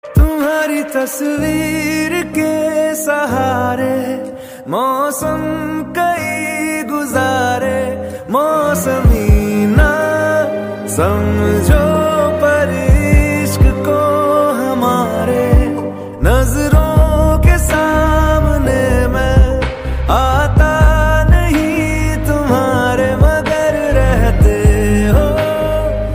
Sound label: Movie Song Ringtone; Chhichhore